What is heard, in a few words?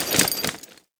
suit, footsteps; player